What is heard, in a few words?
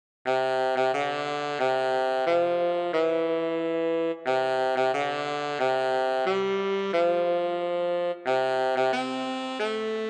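solo tenor saxophone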